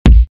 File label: KICK; ATTACK KICK 2; attackpack